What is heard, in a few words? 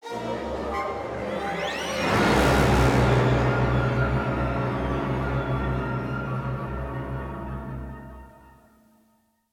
Sting Grab Sword; Necropolis